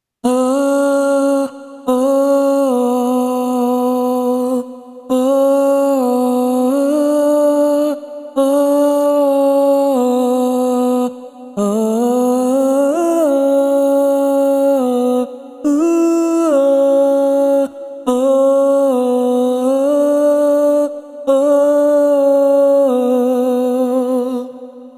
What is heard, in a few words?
Vocal Recording Sample MIC WET